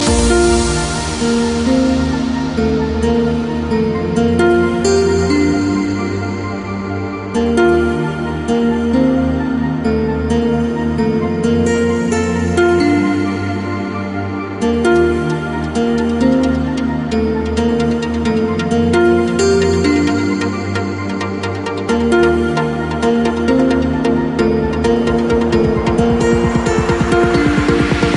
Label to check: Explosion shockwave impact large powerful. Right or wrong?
Wrong